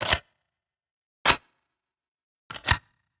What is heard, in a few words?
rifle Reload